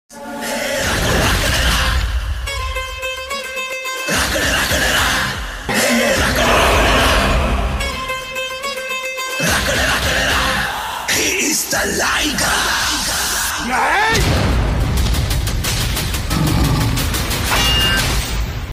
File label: Telugu bgm ringtone; liger